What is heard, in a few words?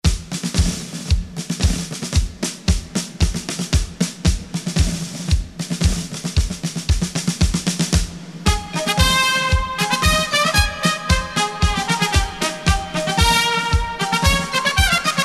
Jingle